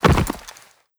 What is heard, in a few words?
body impact; physics